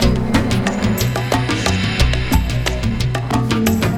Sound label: keys, jazz loops